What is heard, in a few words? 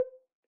Ocarina Sus Vib C4 rel, Ocarina, Typical, Releases; Aerophones; Edge-blown Aerophones